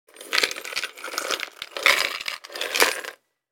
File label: Searching jewel in jewelry box